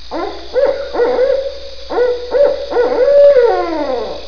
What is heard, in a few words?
Barred Owl Common